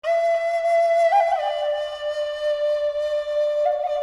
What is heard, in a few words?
flute calling; peter pan; indian